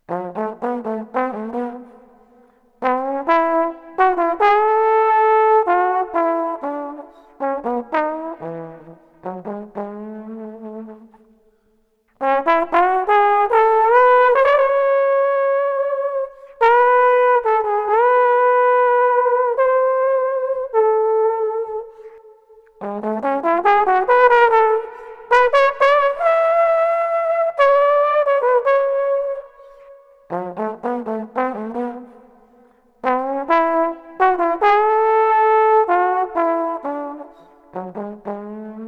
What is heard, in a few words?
Street Trombone Melancholy 70